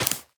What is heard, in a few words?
place, cactus flower; minecraft; block